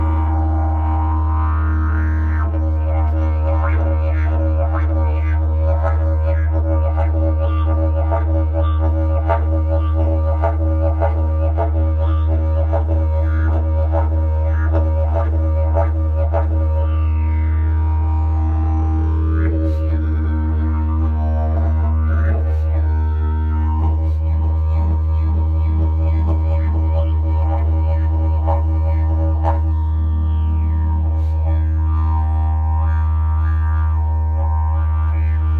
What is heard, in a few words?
didgeridoo; jilm bleskoles